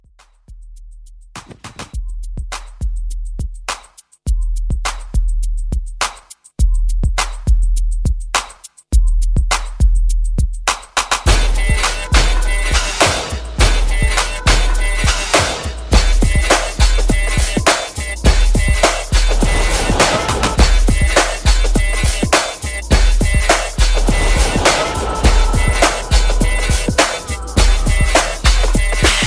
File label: Halloween Redrum 103 BPM